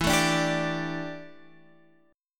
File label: G Suspended 2nd E, Guitar; Gsus2/E strummed; JGuitar